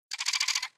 Sheep Voice; Doodle Jump 2

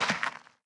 sand footstep

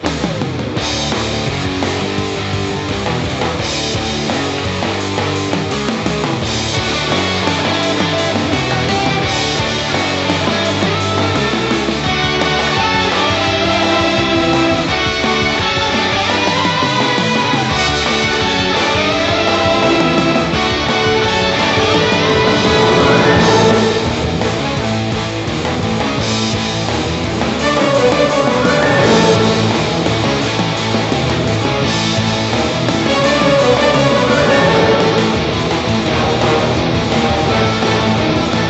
Start Menu; generator rex agent of providence 2011 nds gamerip

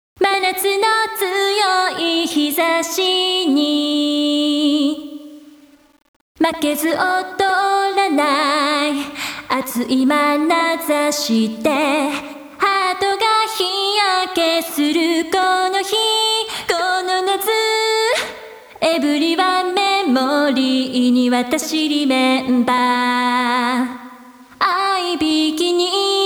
karaoke bgm

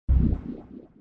sell Tower; Bubble Tanks Tower Defense

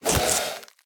Ghost Attack; The Town

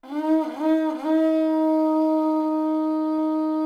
High Conch; Civilization Revolution

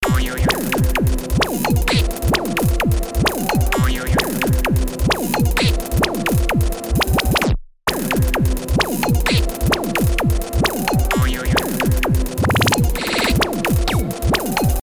lsd music; lsd revamped

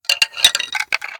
Bones Jump Scare; Devour; The Inn

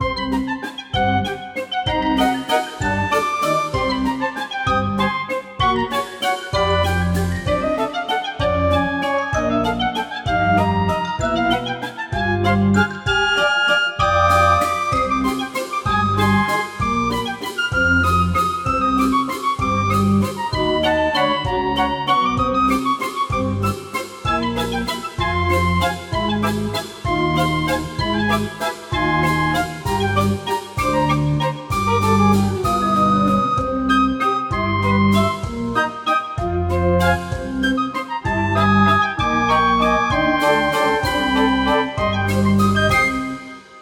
danger funny; Identity V

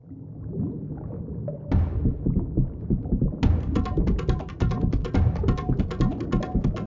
Boss Music Intro; crouching pony hidden dragon

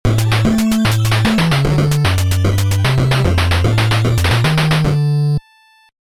Big Boss Clear; Cosmo Police Galivan; NES

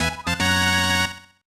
Fanfare; Kirby's Kira Kira Kids; snes

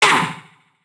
cowboy pain

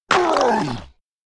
Goblin Die Low

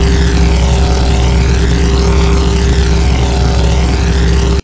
Engine Gear 5

